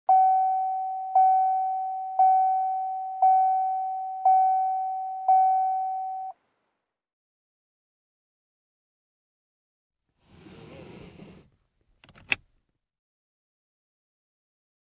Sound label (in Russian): Signal ne pristegnutogo remnia avto